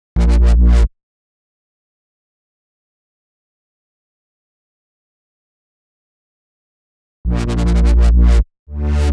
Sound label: 127 G Bass, Complex Electro Synths; Prime Loops; Adversity